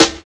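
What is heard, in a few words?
hyper snare; Drums In Your FACE!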